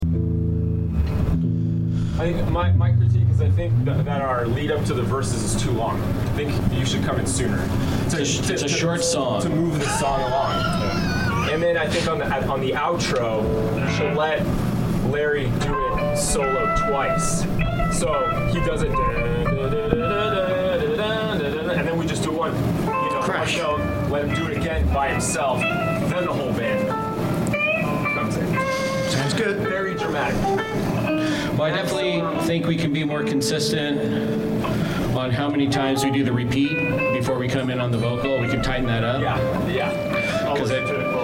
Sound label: Background Chatter